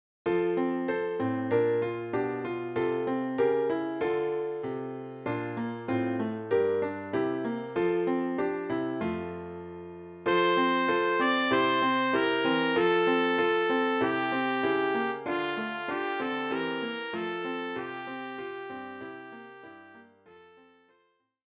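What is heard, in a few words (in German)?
Alle Jahre wieder Trompete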